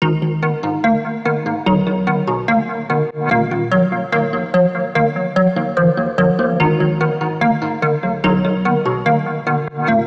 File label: melody pucks 73 Ebm